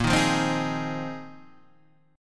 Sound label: A sharp 9th Suspended 4th A sharp; x,x,8,8,5,7,5,5